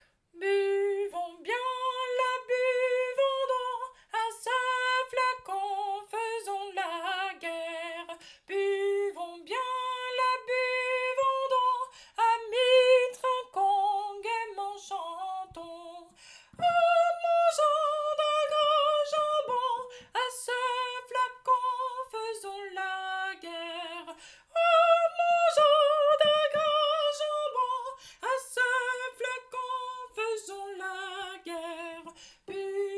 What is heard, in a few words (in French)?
Tourdion tenor